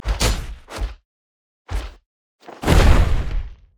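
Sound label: emote death; nautilus astronaut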